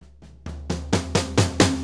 4-4 Fill, Drum Loops

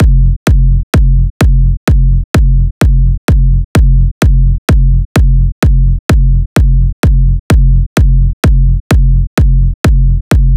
128BPM Kick; Vengeance; Essential Clubsounds Vol.5; Mainstage Kit; Root Fmin; Drums & Perc

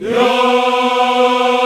VOX, African Yas; Roland LCDP11 Africa VOL-1

